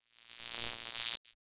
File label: ui error